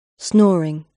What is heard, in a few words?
snoring